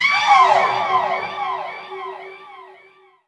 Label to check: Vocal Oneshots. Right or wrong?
Right